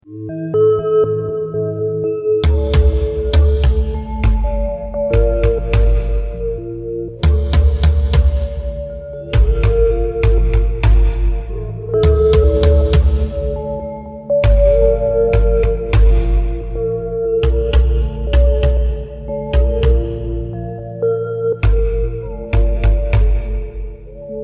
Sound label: mansion drums